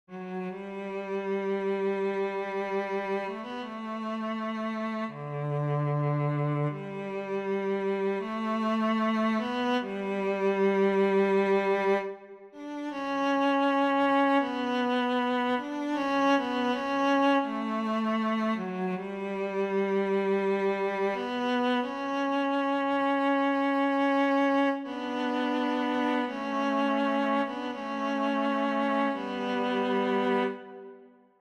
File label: cello dry; ik phil